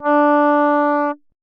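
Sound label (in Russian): синтезатор одиночный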